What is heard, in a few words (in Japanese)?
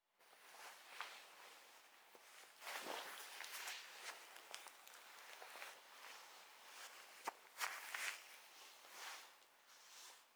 覆い被さる; 動作系